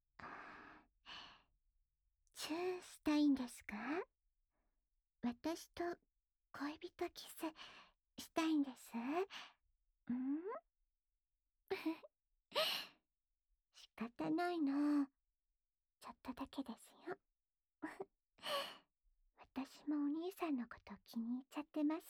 オナサポ用優しいキス

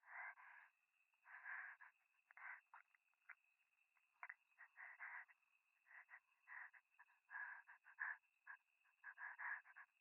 右耳 快感 吐息 山奥 夜

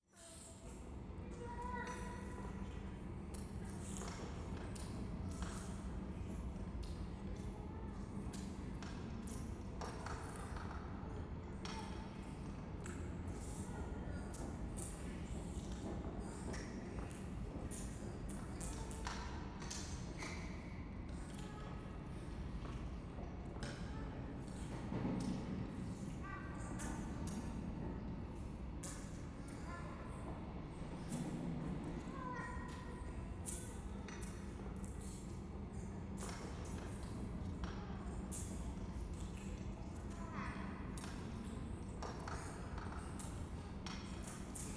イラマチオ 電車

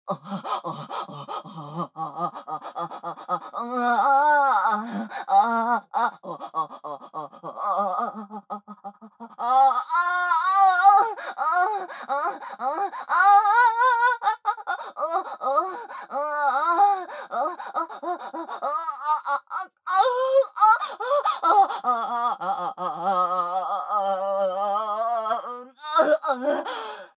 正常位オホ・激しい, ASMR; loop音声

